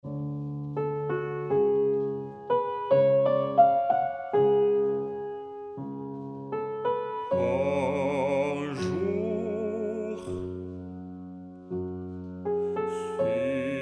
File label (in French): Un souf Tenor